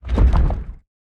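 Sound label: movement heavy push; smite; god atlas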